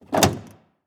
Semi Truck Vent; Large Vehicles; Blastwave

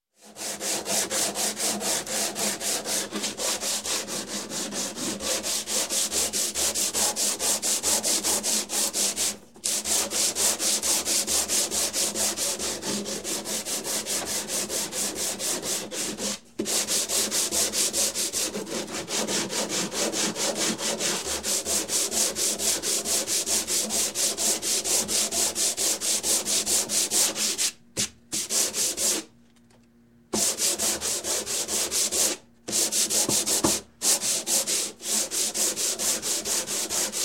Construction, Sanding Wood By Hand; Sound Ideas 2000 Series

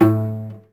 Cello Hit String Snap; SFX Kit; Foley - Misc

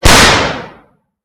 Rocket Launcher Sci Fi; 1001 Sound Effects

